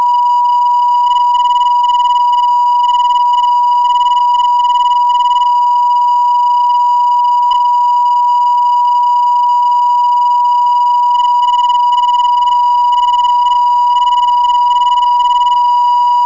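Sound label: 297kHz, non-directional beacon; PNY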